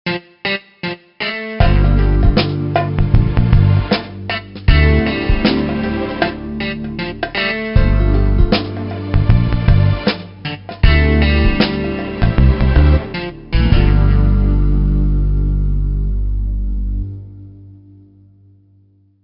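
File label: suspense synth guitar; Plus Belle la Vie Le Secret du Dr Livia; nds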